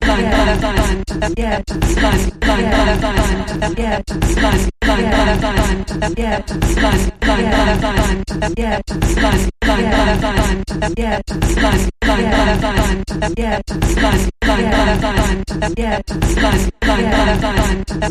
Sampled Voice Percussion Variation Long Version

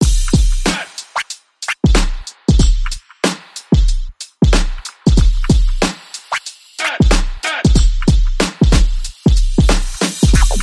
Drums and Vocal Chops Only

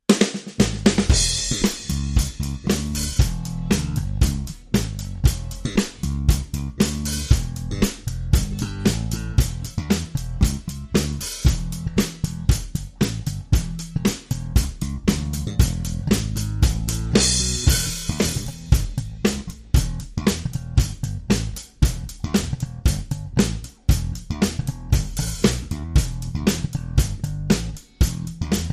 Drum & Bass

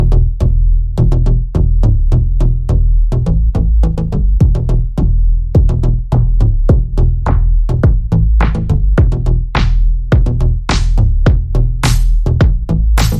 Drums Bass and SFX Only

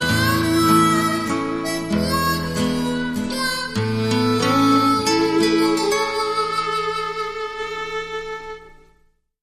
Heifers Waltz, Sting

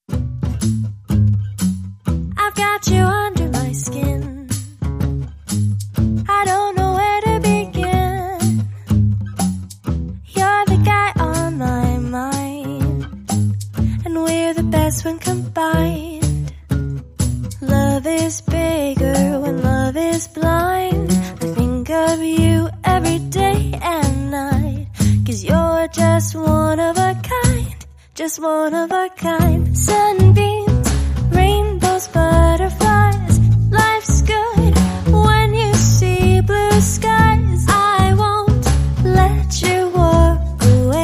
Drum Bass and Vocals Only

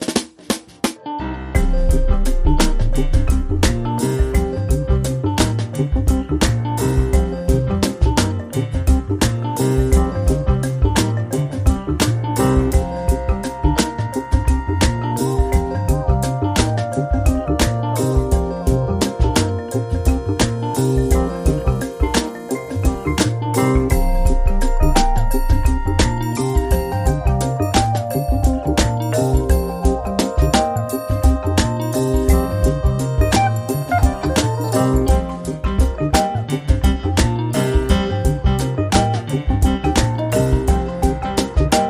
Afro Smooth

